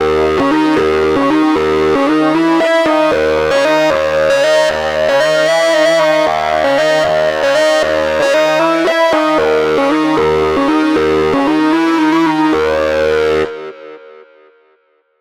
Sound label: hybrid phased; porphyra hybrid; ocean swift synthesis